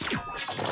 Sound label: metallic